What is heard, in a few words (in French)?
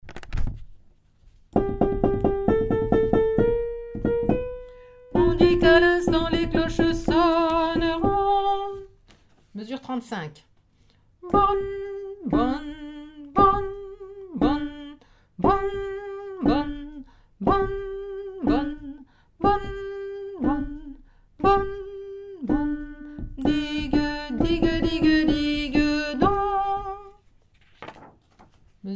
basse